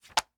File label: set, figures set on table